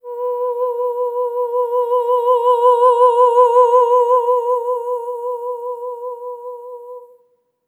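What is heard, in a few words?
soprano "oh" B4; Spectrasonics; Symphony of Voices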